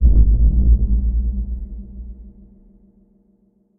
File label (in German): auswahl, menu